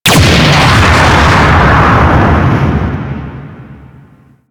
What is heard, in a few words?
Mine Projectile Explosion